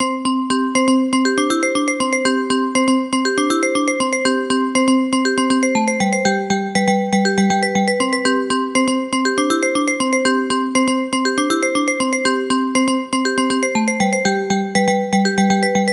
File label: Bell Ringtone; INOI 2 Lite 2019; ringtones